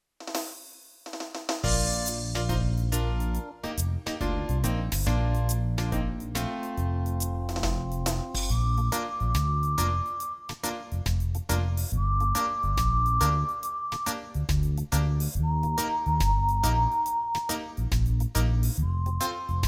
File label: 6 8 Easy does it, brass; backing tracks